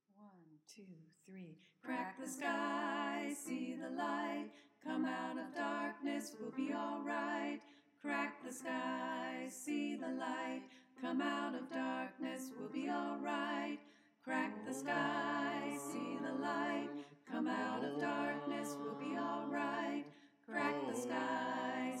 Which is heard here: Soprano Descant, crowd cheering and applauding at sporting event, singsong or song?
Soprano Descant